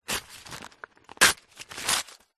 foley paper bag small thin rip tear; zapsplat

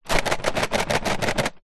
office colouring pencils in tin shake

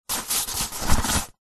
soccer ball spin in hands; sport

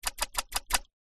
multimedia game designed water object hits x5

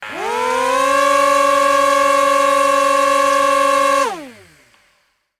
drone or rc plane motors whir med; toys

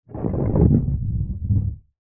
snooker ball roll table recorded underneath; sport

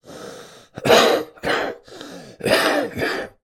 human male with bad flu or cold chesty cough

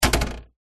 household popcorn maker machine plastic flap open

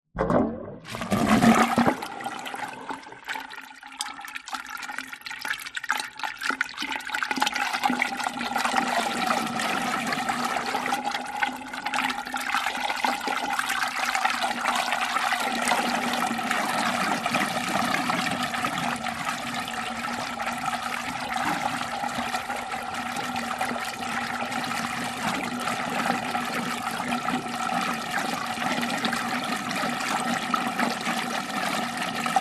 household bathtub drain long

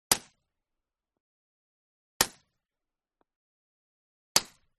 Gun Shot Silencer; WEAPONS; smartsound

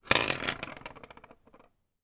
office pen ball point biro roll across table; sound effects one

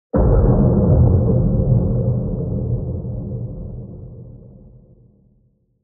impact underwater or space crash distant; lukas tvrdon

